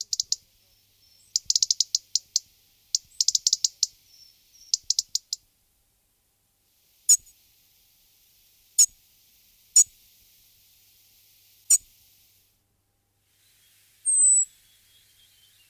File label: Erithacus rubecula Petirrojo; aves; los seres vivos